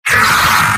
pain sharp; sniper; video games; source engine; tf; mvm

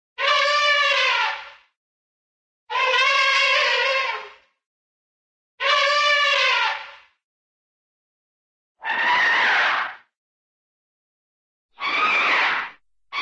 elephant elephant trumpeting, three times, animal; cartoon

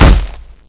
vort foot; Source Engine; video game; npc

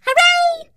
turret launched

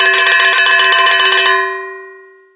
gallery win; loud; Left 4 Dead 2; Source Engine

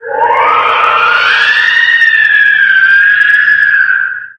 banshee, halloween; Source Engine; tf

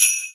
xmas sleigh bells

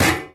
physics turret gibs

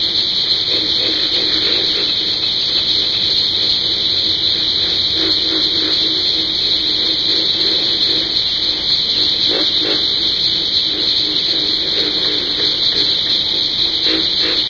cave scree loop; video games; ambient; source engine; portal2

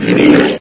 ag pain; agrunt; video games; source engine; bshift

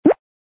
bloop; cartoon; hannah barbera cartoon sound fx

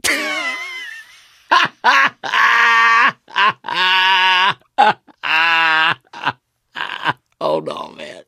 laughter, survivor; voice; source engine; left 4 dead 2

